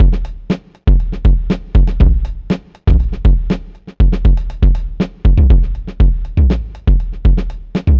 past groove 120bpm, Downbeat Dance Grooves

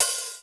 M615 HAT; Crypton - DrumBase5000; BABY KITS

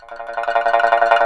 SNXN TRML A#3, Heart of Asia; Spectrasonics

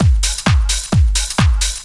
drum 130 trance

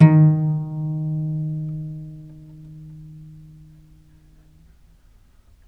viole pizz D#2, Historical Instruments; USB Soundscan vol.23; AKAI

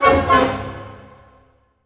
Orchestral Loops; 128 VERTIGO

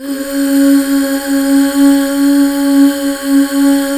UUHH C#4 R, Hallelujah, BACKGROUND; Best Service

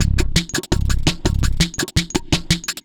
Beat, Drum N Bass

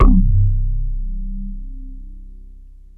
BASS A, MORPHEUS; Syntec; Wall of Sounds

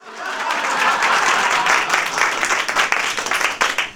HAHA CLAPS; ClapsOohsAahs; SFX

